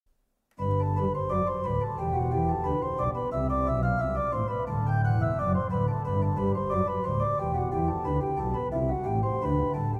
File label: organ; pyroomacoustics demo